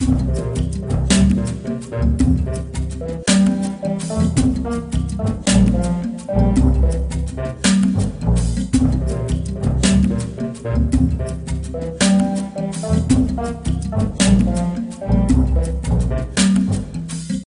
Jungle Level

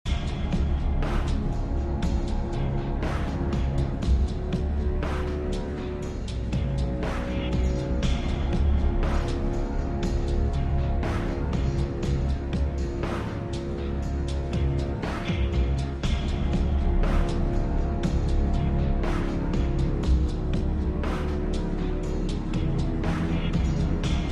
Ambient Mission

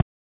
Goalie Kicks Ball

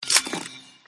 Click Setting

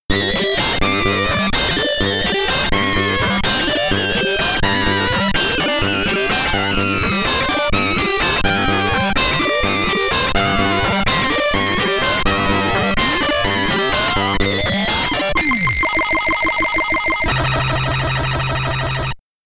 Game Over SID Stereo; Phantom of the Asteroid; commodore 64

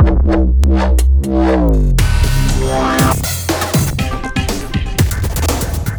Taunt; Evos

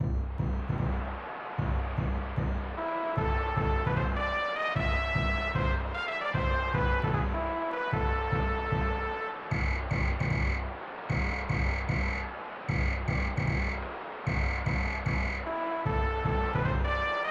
Baseball

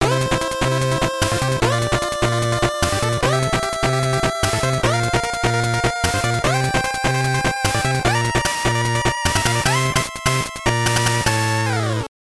Jingle; Bass Masters Classic; GBC

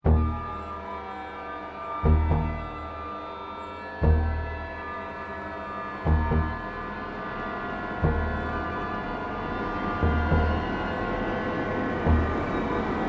Escape Music; Spider Cellar

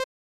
Toggle Option; Space Invaders; SNES

